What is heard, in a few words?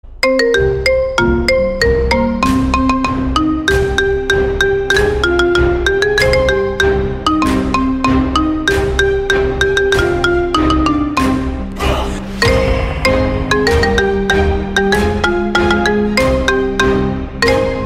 Epic Marimba